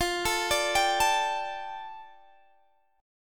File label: F 6th Add 9th F Guitar Open G